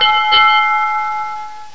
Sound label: bell